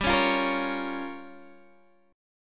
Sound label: G Minor 7th Flat 5th, Guitar, Standard; strummed; 15,16,15,15,14,x; capo=14